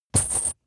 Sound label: metal chain impact